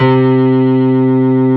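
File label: reverse piano C2; AKAI S-Series CD-ROM Sound Library VOL-2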